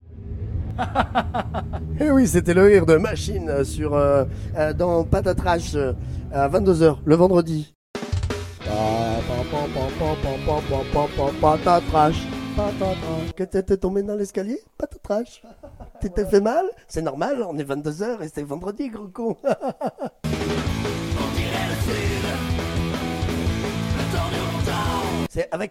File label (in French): jingle